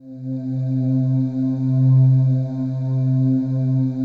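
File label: PAD, atmospheric pads; Best Service; XX Large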